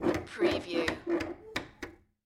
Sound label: Dog Door Swing